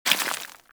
Bullet Impact Debris Rock; Warframe

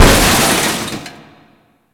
Crp Infested Vent Smash; Gameplay; Warframe; Corpus Infested

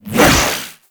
Briefcase Katana Whoosh; Warframe; Corpus

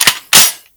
Grineer Lever Action Rifle Cock; Weapons

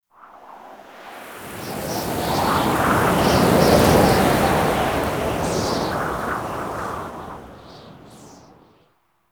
Exterior Wind Gust; Ambience; Warframe; Duviri; Murmur

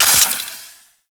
Quill Dart Gun Poison Mech

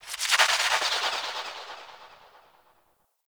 Distant Metal Textures, Asteroid; Warframe; Grineer

